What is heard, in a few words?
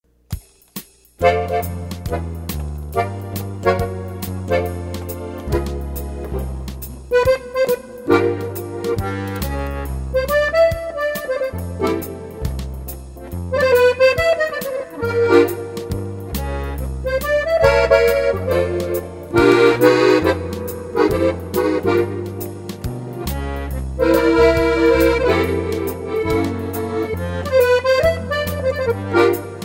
French Band; Denver